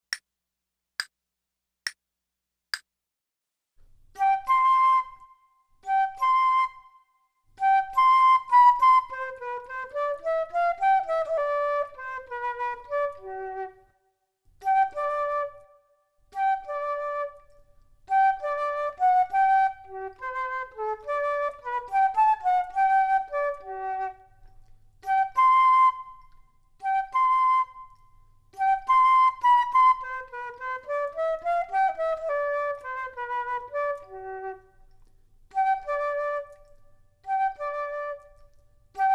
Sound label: Duets flute; Selected Duets for Flute Podcast